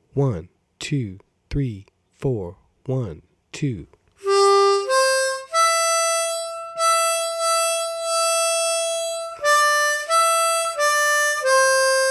Red River Valley Slow

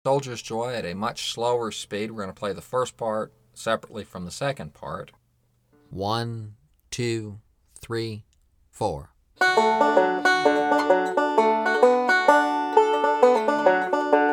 65 Bpm; Soldiers Joy Break 1

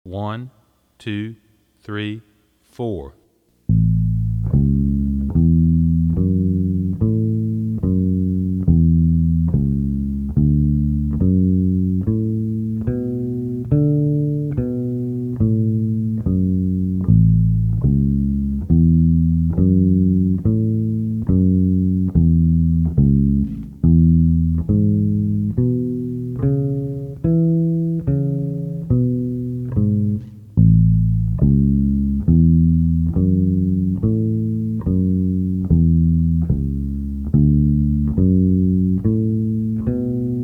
Walking Up The Neck Slow

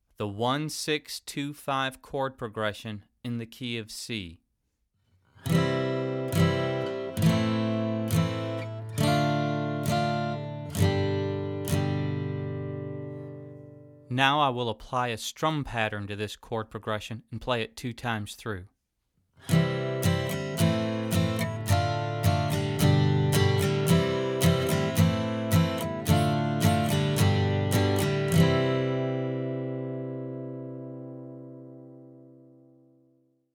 1 6 2 5 Chord Progression

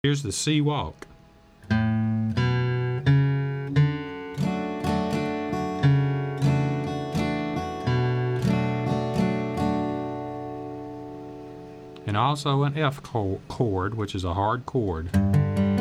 C Walk

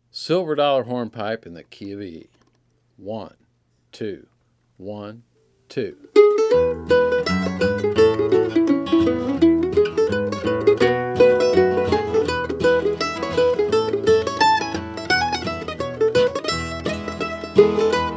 Hornpipe; Silver Dollar

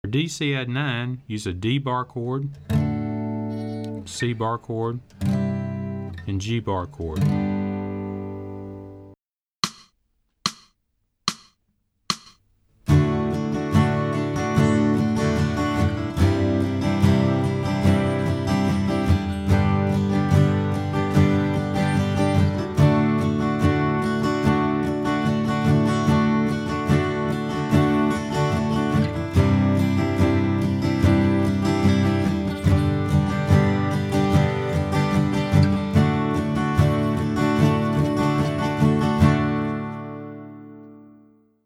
C Cadd9 Progression Barre Chords

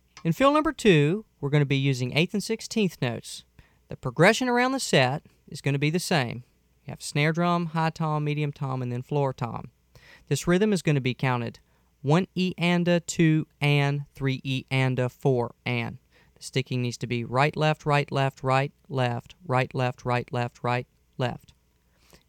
Fill

